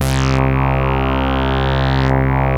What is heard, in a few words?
ARP Odyssey